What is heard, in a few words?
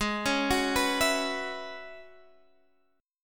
F sharp 7th Suspended 2nd Suspended 4th G sharp